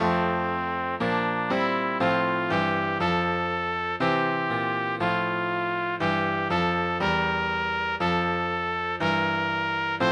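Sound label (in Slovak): pre trúbku a klavír; Duša moja celou silou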